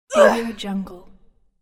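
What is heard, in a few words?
girl combat shout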